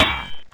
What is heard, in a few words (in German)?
block schild; mount blade